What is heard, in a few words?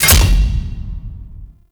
Attack Impacts; Gameplay; Golden Maw; Warframe; Ambience; The War Within